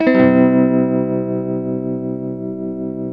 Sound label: TEL TM U MAJ C#, TELE CHORDS, Guitars; Spectrasonics; Hans Zimmer Guitars VOL-1